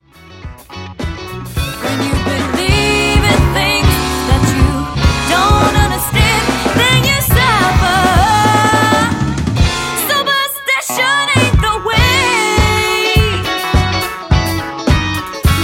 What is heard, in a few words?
Soul Band; Lancashire